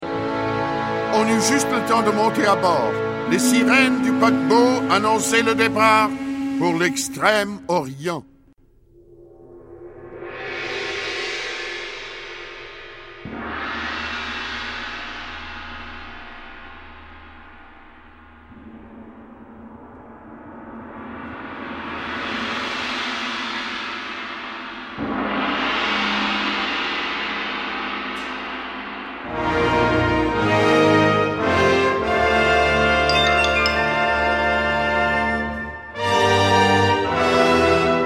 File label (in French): chine tam-tam gong; passeport piccolo